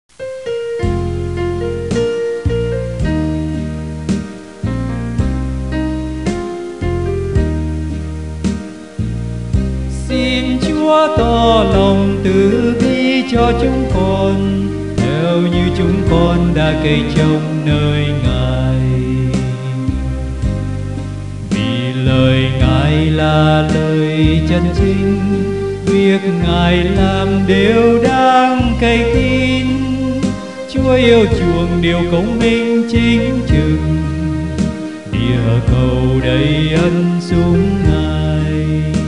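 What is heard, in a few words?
soprano; Đáp Ca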